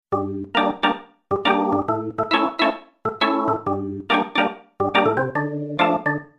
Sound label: REGGAE; d-50